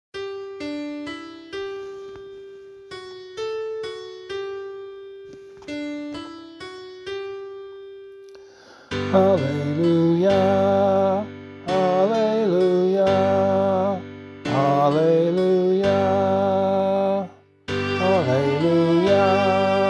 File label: Gospel, vocal